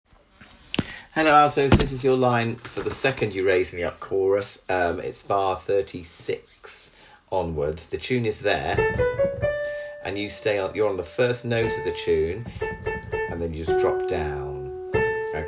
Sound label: Alto 2nd Chorus